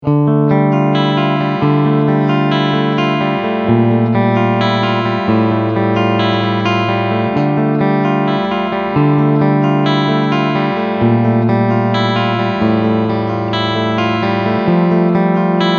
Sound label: Clean Picking